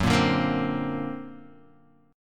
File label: D Augmented 7th Gb, guitar